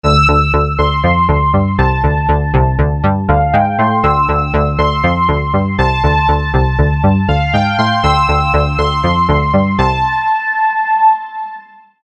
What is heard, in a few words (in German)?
Sequenz